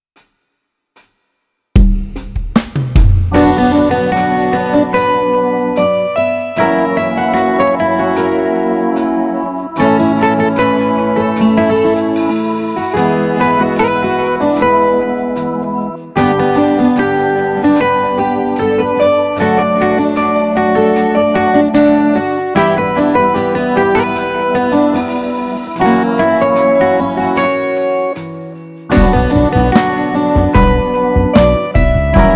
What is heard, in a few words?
HC 75 BPM